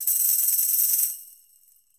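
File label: Tambourine C FX; Latin Percussion; Drums; Drum Hits; Ableton; Factory Packs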